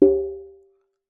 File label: Jembe; Percussion Samples